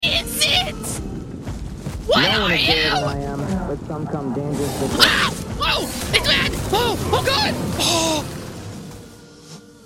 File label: ehhhhhhhhhhhhhhhhhhhh